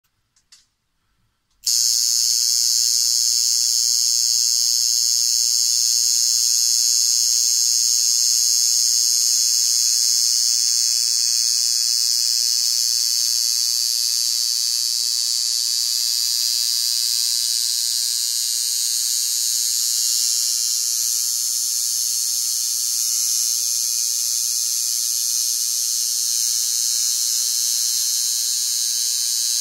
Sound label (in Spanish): maquina de tatuajes